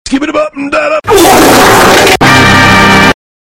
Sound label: loud fart; skibbity; sicko